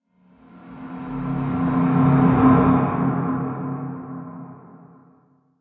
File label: cave, ambient; Eaglercraft b1.3